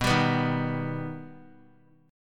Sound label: B Augmented B, chord; strummed; JGuitar